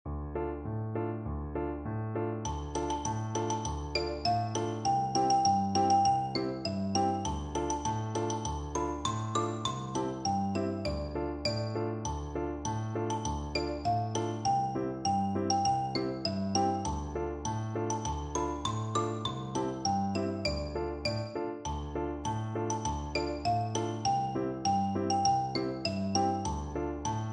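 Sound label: xylophone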